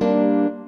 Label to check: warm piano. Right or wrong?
Right